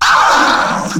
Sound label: classic aaah; sample pour scratch